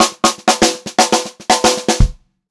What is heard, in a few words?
120 pop fill, Pop-Rock Drumloops; USB Soundscan vol.37; AKAI